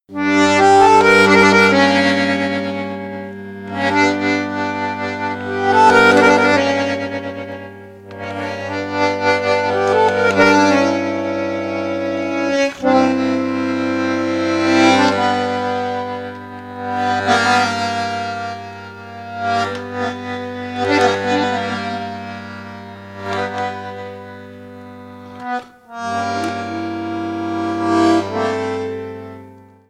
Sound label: Bandoneon; Staalkaart